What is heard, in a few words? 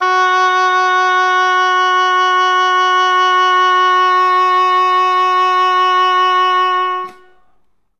ob F#3, Oboe; Best Service ProSamples vol.51 - Classic Orchestra 2